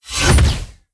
Drone Spawn; blue archive

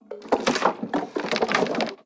Clatter; blue archive

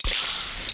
Spray; blue archive

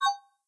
Appear; blue archive

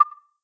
REC; blue archive

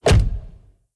Vehicle Close; blue archive

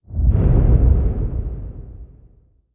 Heavy Foot Step; blue archive

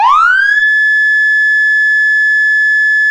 manual, sirens; federal signal pa 300

